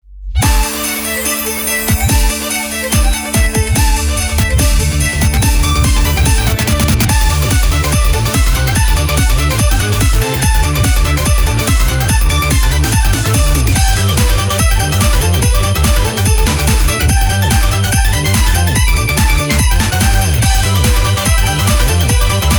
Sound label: Bgm Bomb; Mahjong Fight Girl (Arcade) (gamerip) (2023)